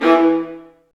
STR, strings; Roland - LA Composer vol. 1

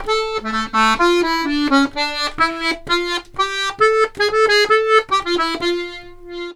accordions; USB Soundscan vol.40; AKAI

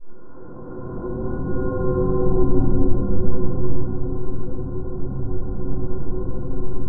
SPACE CRY; Distored Reality

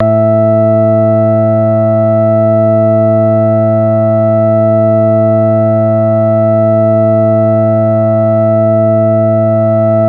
Pad A1, Prophet; Worra's Prophet ProphOrg; Oldies

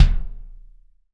BD, BD REAL

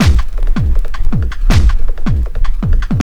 JUNGL 160, JUNGLE LOOP

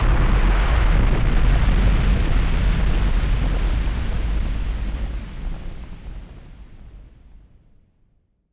Noise Bomb Rumbler; Noise Bombs; musicradar; bomb factory

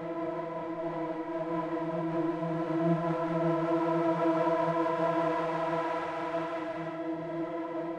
Drone; stereo toolkit samples; Non Tempo Loops

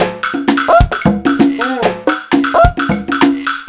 Latin at 130 Break, Percussion Loops

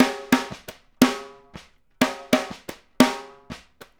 Snare Pattern, Drums; Essential Percussion

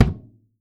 African, African set percussion; Voice Crystal - Steve Reid Definitive Percussion

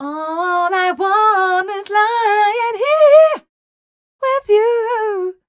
f mel e flat, fem Vocals

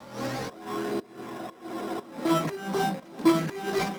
120 E FX, MELO + FILTER; Virus; RoBKTA Sample Pack Supreme

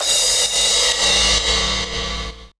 Crash; Equipped Music; Loungin House

